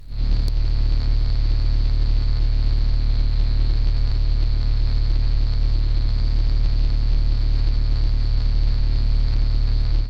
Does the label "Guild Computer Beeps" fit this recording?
No